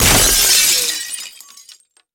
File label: Crash Glass Wood